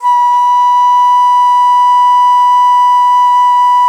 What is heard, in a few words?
DIZI; E-MU Producer Series Vol. 7 – Old World Instruments